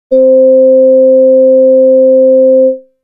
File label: Solar-Flute B